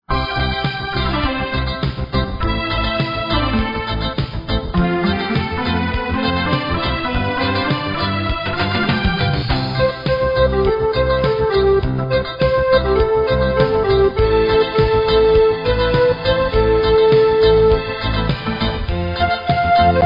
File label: Reggae Jumper